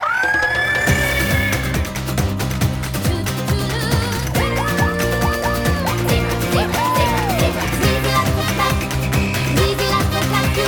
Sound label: Theme; DKB; Zebra Bananza